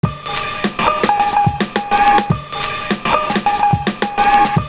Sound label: CRAZY BOSSA, LOOP